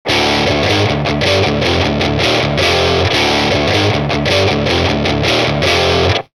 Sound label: Solo Heavy Rhythm Burn, Guitar Effects Pedals; Pro Co